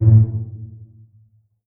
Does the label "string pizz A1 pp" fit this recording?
Yes